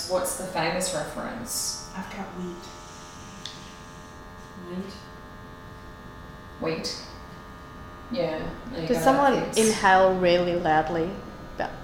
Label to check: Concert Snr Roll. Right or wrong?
Wrong